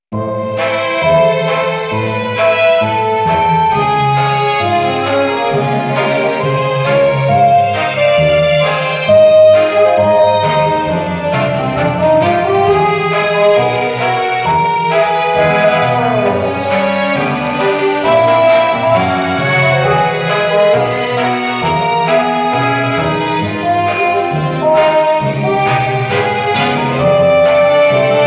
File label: Water Gramophone; Zombie Tomb